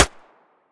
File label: hpsa mono distant